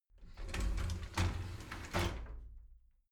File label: shower slide door inside open soft